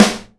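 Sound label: short steel snare drum a key, tuned to the A note; Duration 0:01; Samplerate 24000 Hz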